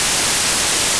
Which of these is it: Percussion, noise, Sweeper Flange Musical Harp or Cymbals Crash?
noise